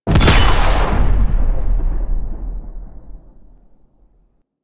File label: Grenade Explosion; Orokin; Warframe